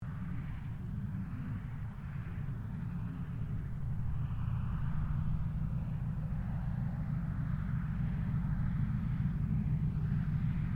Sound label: AIRCRAFT CA-13 BOOMERANG TAXI DISTANT; Airplane; Vehicles; Airplane Sound Effects